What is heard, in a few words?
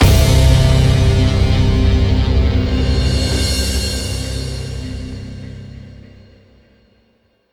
The Bass End; Scourge Outbreak